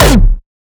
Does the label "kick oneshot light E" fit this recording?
Yes